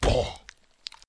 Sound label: zombie hit